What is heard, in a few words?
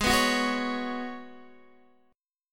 Ab Minor 11th, Guitar, Open D; x,11,9,12,9,11; down